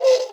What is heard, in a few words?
move, hinges drawers; tictac